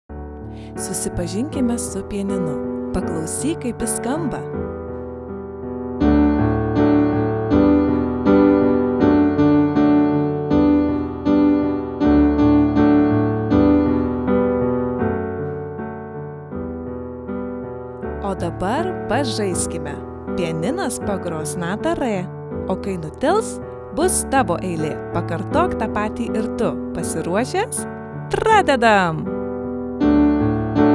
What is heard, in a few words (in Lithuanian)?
Pianinas; Susipazink su instrumentu